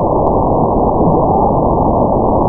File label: randchirp snr 20; chirplet chain